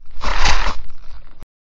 Guillotine

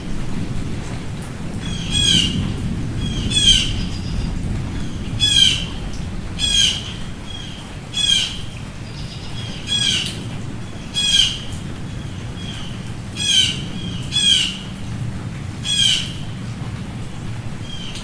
birds after rain; Mike Koenig

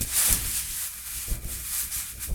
cloth

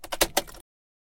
Keys In Ignition

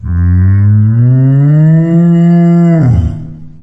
Cow Moo; Mike Koenig

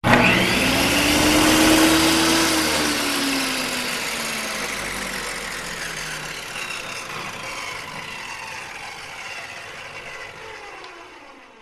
Electric Saw

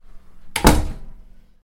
Close Door

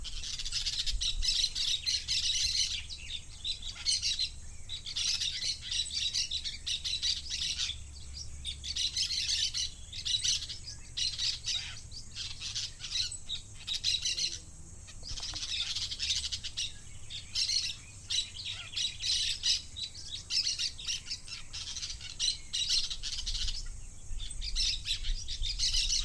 Parakeet

